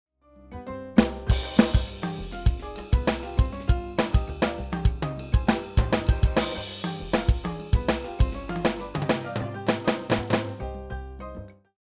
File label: Millenium MPS450 Backing